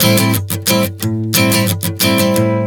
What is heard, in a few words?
Strum 090 Am, One Chord Strumming 090 bpm